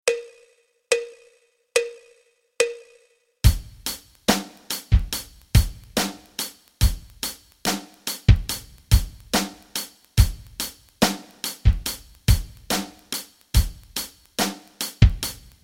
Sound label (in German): rock rhythmen